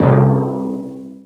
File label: thump, Tympany, Misc Percussion